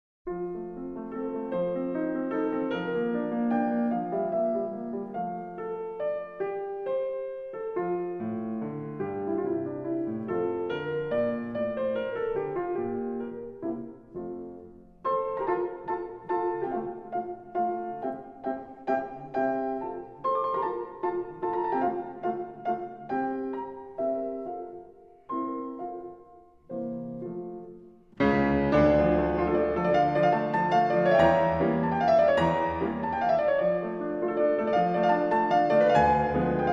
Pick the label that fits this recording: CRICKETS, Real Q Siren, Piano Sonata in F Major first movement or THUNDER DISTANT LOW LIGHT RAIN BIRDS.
Piano Sonata in F Major first movement